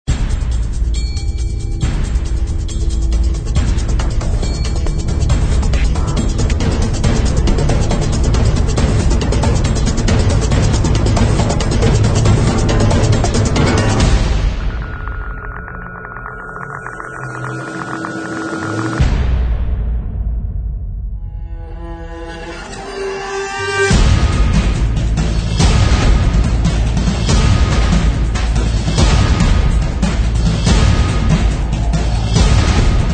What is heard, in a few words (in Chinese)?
Epic Action Trailer, 强大的，史诗般的打击乐驱动了这个令人兴奋的新电影预告片的动作。